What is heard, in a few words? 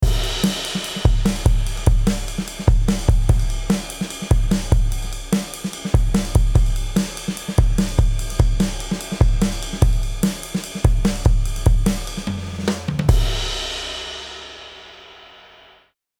Chandler Drums comp gr 4db; Waves